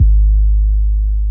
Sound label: Kick Sub Wah Soul